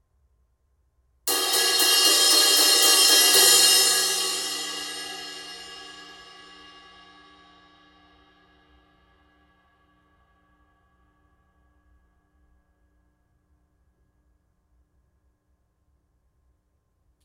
16in Heavy Crash, cymbal sound

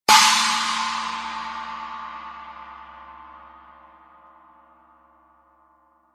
20in China, cymbal

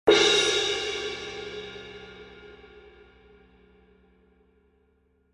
K Constantinople Suspended, cymbal

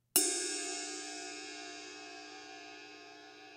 S Medium Ride Crash, cymbal sound

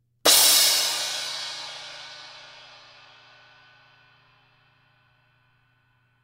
S Trash Crash Crash, cymbal

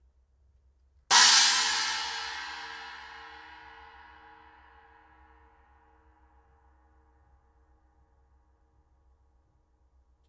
Custom Hybrid China, cymbal

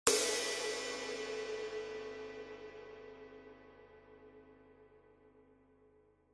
20in Custom Flat Top Ride

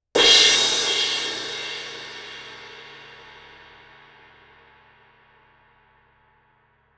symphonic suspended 18 Small Ride hit, cymbal; K Klassisch Symphonic; Stick Strikes; オーケストラ用シンバル; K Klassisch Symphonic※は、繊細な音楽表現を大切にするアーティストがコンサート大ホールで演奏することを考えて開発された新しいオーケストラ用シンバルです

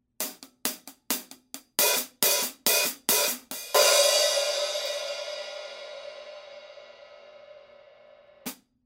Kerope, cymbal sound

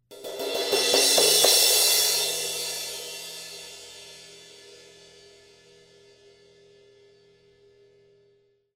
S Rock Crash Build Crash, cymbal sound

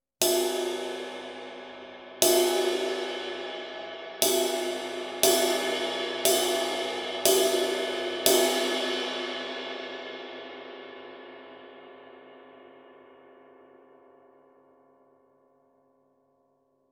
20 Thin High Bow, 20" Kerope Thin High Ride; ride cymbal

